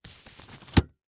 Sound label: Umbrella Open, Household